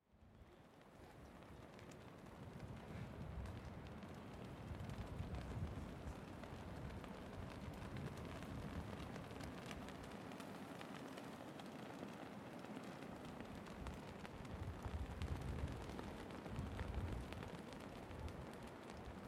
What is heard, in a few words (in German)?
warnemunde flaggen am strand